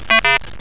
BIRDIE; 999wavs

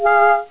beep

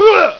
GRUNT M; ROADRASH

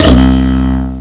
DROID

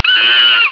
monkey

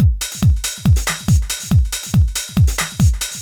DRUM, HOUS-TEC DRUMS; MAGIX

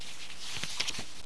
PAGE TRN; AMBER

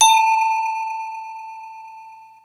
BONG; BEAT2000

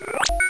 ZOOM IN; FRAGILE

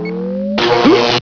SHOCK; doomap2

